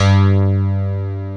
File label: ELEC GRAND, PIANOS KEYS; Pro-Rec - Synthsonic